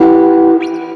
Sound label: Guitar, Dirty Chord